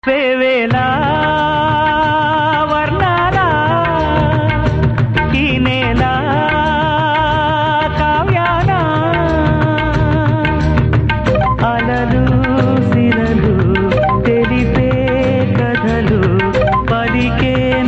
Telugu Ringtone

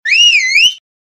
Whistle Ringtone

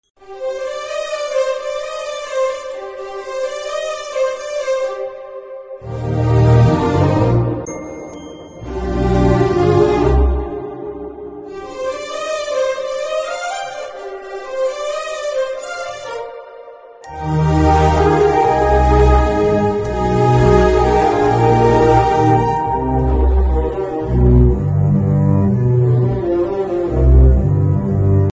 BGM Telugu Ringtone; Majili

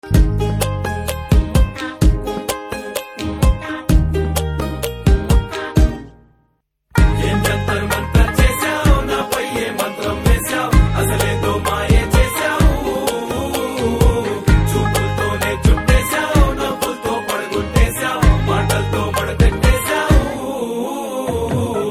BG Tone Telugu Ringtone; Pushpa

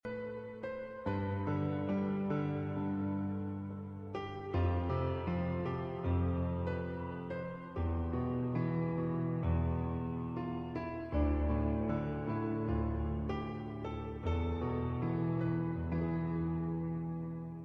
Sad Piano

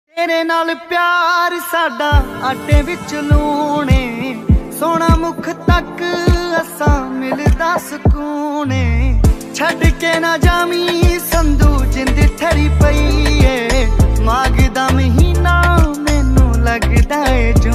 Dance Punjabi Ringtone

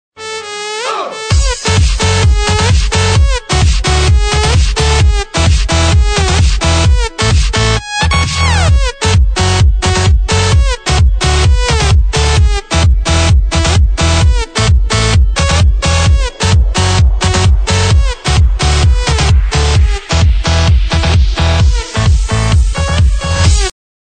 Club Night Ringtone

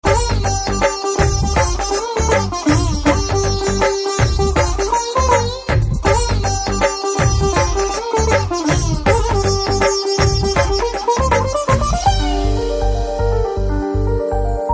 Piano Telugu Ringtone

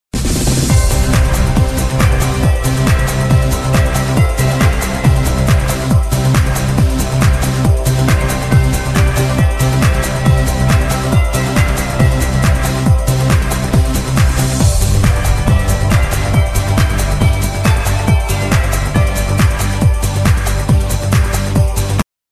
Christmas Techno Ringtone

